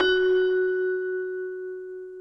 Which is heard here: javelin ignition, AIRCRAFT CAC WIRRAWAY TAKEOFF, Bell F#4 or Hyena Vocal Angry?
Bell F#4